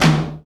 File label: TOM, KIT Real-Kit; 90 s Sample CDs; Sound & Vision - Gigapack I CD 1 (Roland); Real-Kit s10